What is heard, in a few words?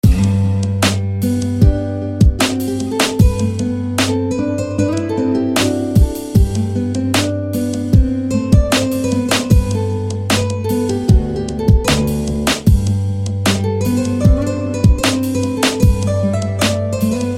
Acoustic; Bonewood; SFB FX